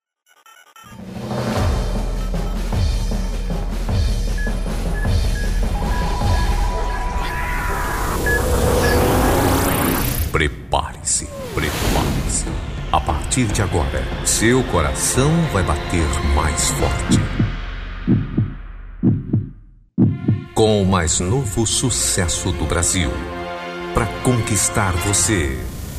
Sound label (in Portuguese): abertura forró